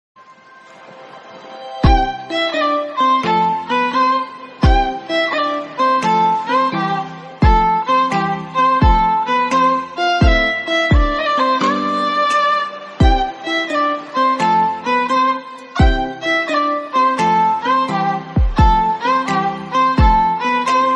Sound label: bgm violin anime ringtone; brook